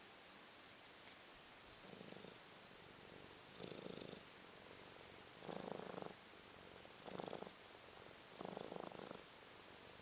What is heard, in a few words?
twenty seconds of purr